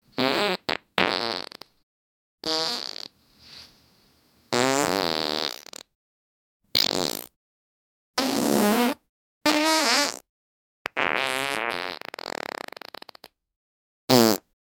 fart sounds of various; flatulence

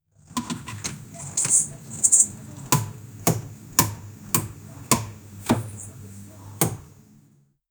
amapiano raiser

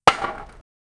a axe getting thrown with, axe throw

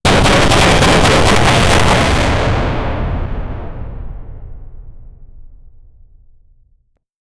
a loud noise like a, 50 caliber

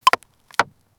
medieval wood chopping, axe

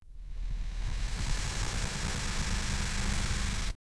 whoosh sound of speed

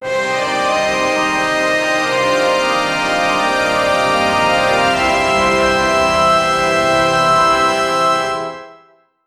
generate a level up sound

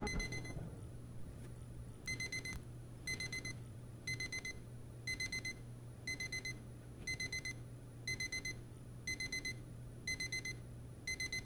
the ringing of the cosmic

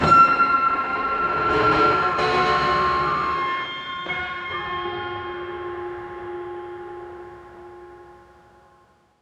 cinematic

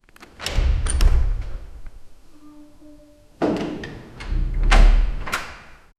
opening the wooden door o, architecture

